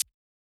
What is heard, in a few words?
hihat, house